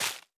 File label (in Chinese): 大型垂滴叶破坏声